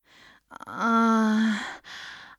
groan; Singles; French Version

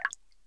drip single; KINGPIN

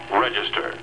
REGISTER

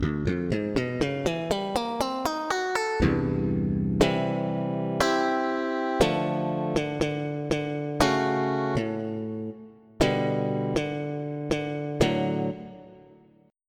Slap Bass; EverQuest

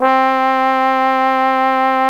Trombone; gmtones

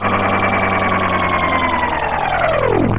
ship body close; Earth and Beyond; EA Games

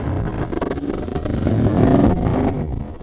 Revolving Doors; 3D Sensations 3DEEP

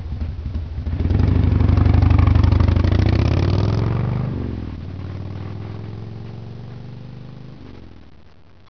BIKE, wheels; Story Maker

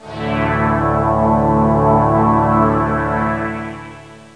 Planet; max

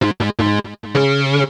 D+B 160; eJay

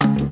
STOP; MS PLUS!

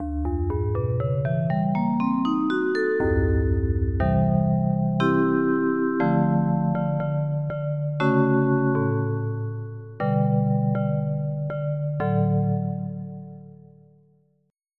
Vibraphone; EverQuest

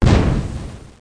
bomb explode; Bedlam 2

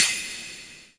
cym; Shogo

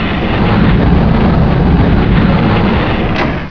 slid door; CHASM

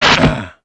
ROCK

